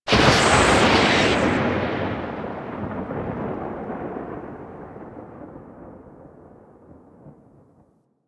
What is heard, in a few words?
Lab Lightning Strike; Warframe; Ambience; Entrati